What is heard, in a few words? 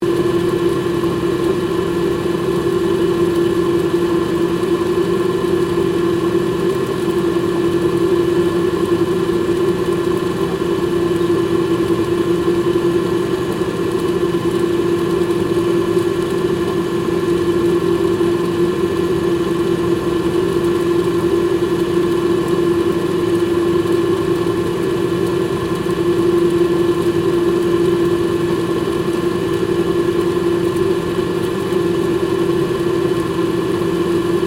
SHIP AIRCONDITIONER LOOP; Machinery; HMAS VAMPIRE